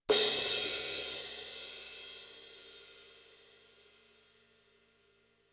18 CRASH CRASH, cymbals

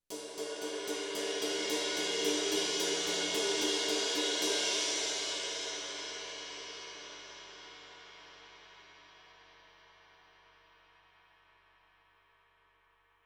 20 RIDE BUILD, cymbals

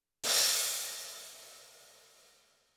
STACK CRASH

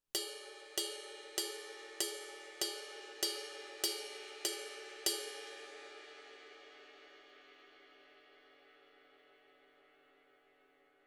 21 RIDE BELL, cymbals

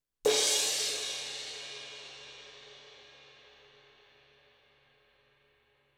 CRASH CRASH

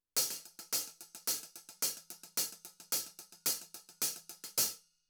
HH CLOSED

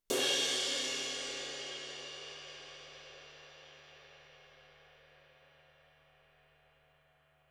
20 CRASHRIDE CRASH, cymbals

